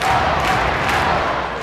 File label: Football Crowd Go Team Loop; Crowds